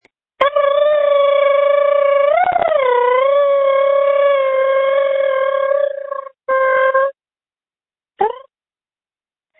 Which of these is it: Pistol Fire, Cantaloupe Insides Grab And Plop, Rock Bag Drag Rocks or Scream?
Scream